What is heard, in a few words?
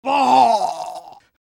engineer pain severe; svencoop addon; tfc; player